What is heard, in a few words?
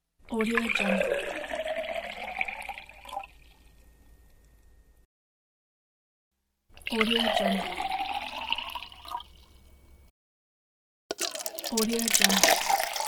pouring water